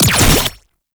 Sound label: Gunbrella Alt Mech; Weapons; Warframe; Tenno; Koumei